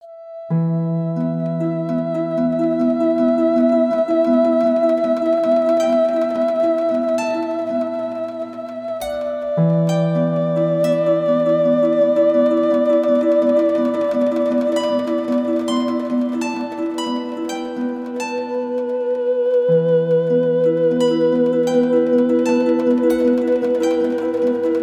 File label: Transition; Ozymandias